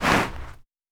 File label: Snow